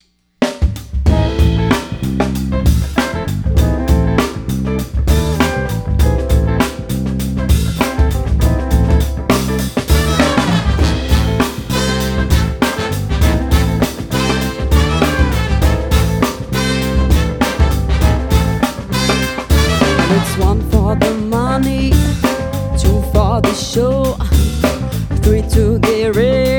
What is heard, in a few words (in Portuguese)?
Jingles, Português - Brasileiro